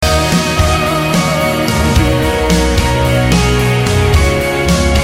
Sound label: Game Tune